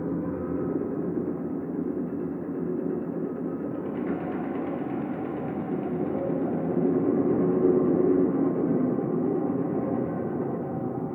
Sacrifice Chamber Ambient Loop; Warframe; Ambience

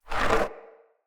Wood Stool Drag Scrape

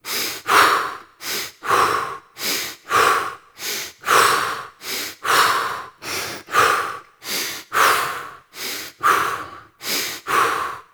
Breath Running Loop; Warframe; New War; Teshin

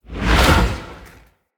Spirit Proj Whizz By; Characters; Enemy; Mockeries; Abilities; SubBoss; Mockery

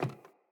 Sandals Run Wood, Footsteps; Player

